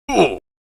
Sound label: hurt; minecraft